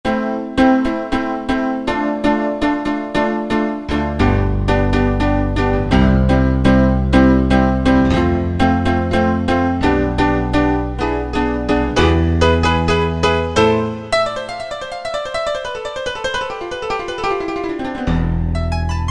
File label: Piano-Fifty; Factory preset demos